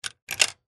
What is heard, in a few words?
coin insert slot machine; safe box